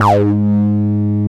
303 Ab2; Jack Sparrow; Deep Dubstep Progressions

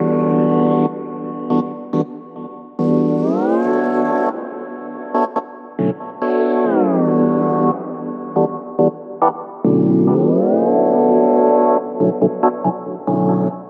Bpm140 Sugar Pad Loop Gmaj Dmaj, Dubstep

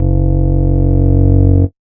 Bass Shot F#; Mutekki Media; Vengeance Electroshock Vol.2

